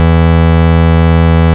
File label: Root E, Bass